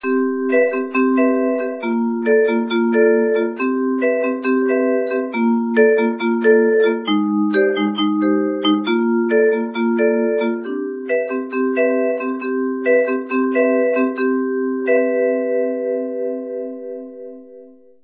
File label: instrument